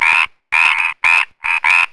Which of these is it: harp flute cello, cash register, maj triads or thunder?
thunder